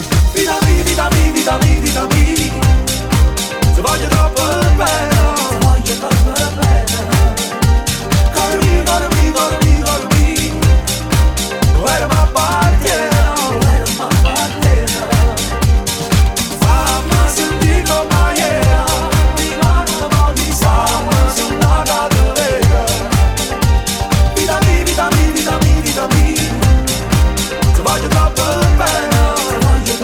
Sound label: Remix